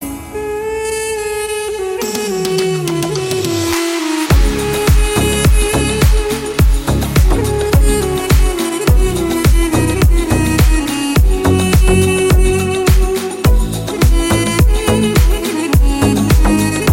Duduk

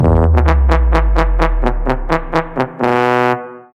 Trumpet notif

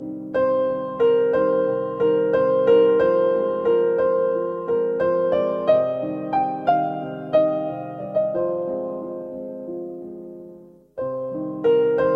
Nice Music

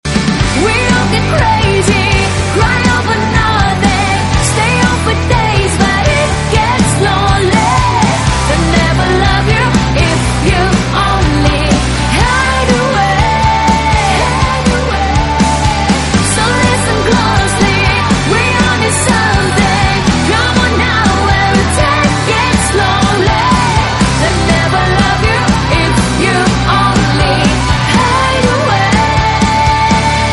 synth

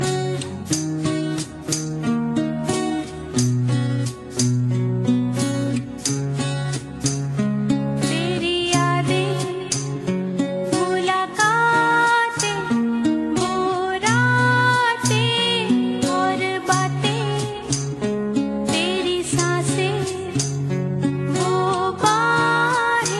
Gitar

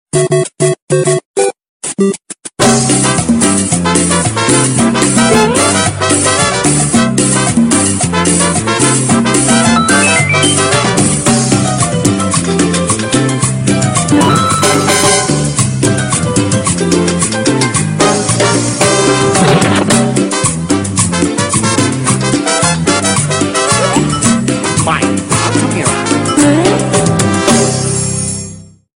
Cumbia; Mario Bros

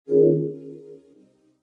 Smooth Notification

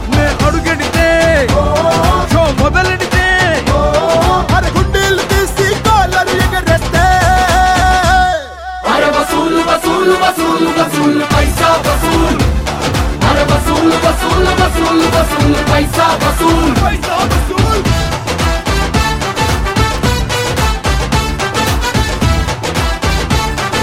Telugu

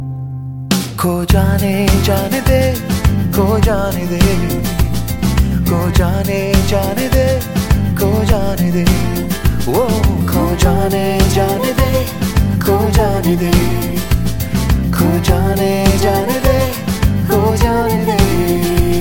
chorus

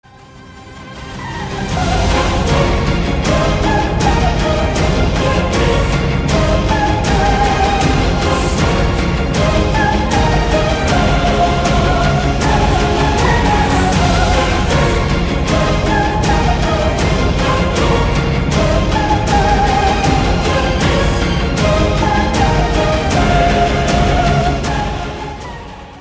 Trailer Music

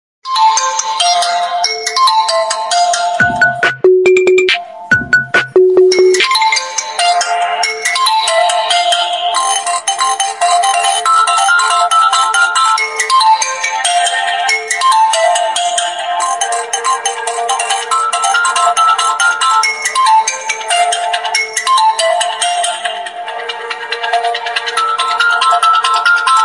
oppo find x2 neo

